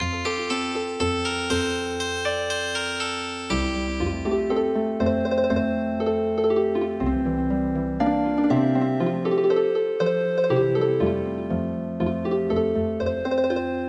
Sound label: Healing Harp